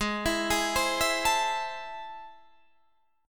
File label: A Minor Major 7th, G sharp